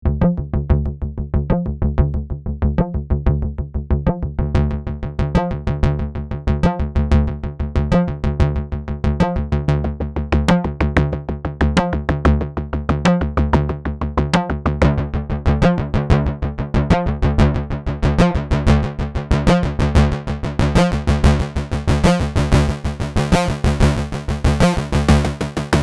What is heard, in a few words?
FM Bass; Moog DFAM